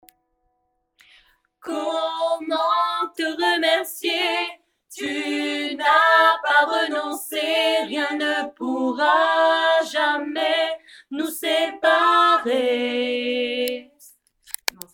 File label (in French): Notre pere pont voix alto